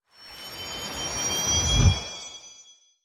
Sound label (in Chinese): 试炼刷怪笼即将生成物品声